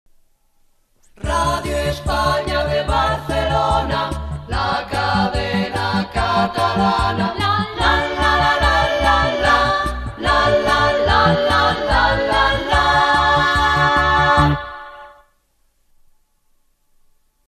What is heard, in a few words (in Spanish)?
Radio España Cadena Catalana (Cantado)